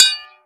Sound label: grenade bounce, weapons